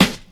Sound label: raw acoustic snare g key; snare drum samples